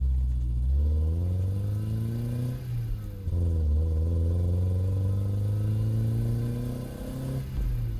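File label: engine low start; Blind Drive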